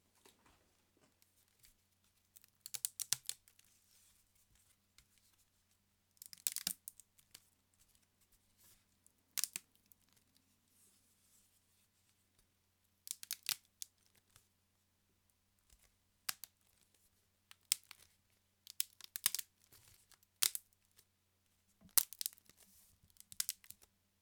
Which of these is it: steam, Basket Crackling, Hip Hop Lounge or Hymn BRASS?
Basket Crackling